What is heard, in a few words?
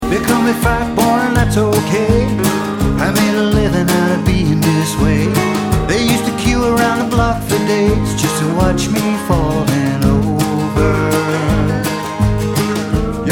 Blues